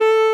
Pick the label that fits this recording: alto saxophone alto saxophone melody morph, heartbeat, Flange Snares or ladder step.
alto saxophone alto saxophone melody morph